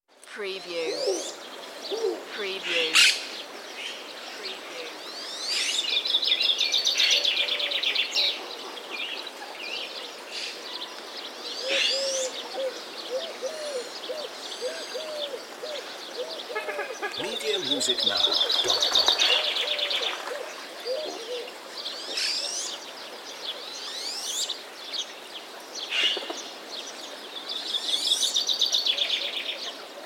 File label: Ambiance Nature Birds Water